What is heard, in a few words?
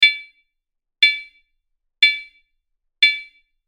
Alert; Audiodesign